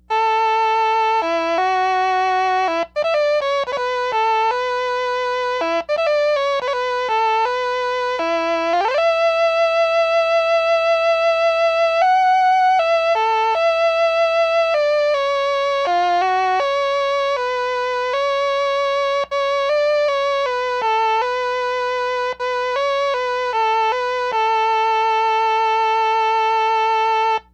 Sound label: Clavioline